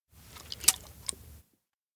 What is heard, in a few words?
spray bottle pass; anemoi